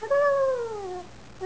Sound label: Baxter Helloo, eclectus parrot